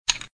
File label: stone undo; inlogic-zootrivia